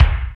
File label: KICK, Tekno Industrial; East West